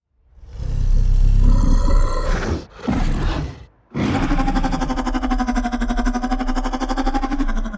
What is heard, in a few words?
roar; skar king